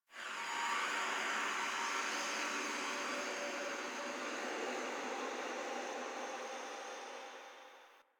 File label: Venus Landscape Wind Atmosphere Anomaly; Ambience; Warframe; Venus Open World